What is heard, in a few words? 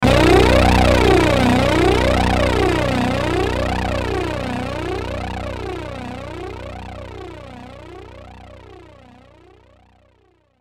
Sweeper Flange Distortion; Production Element Imaging Element Accent Transition; Sweeper Sound Effects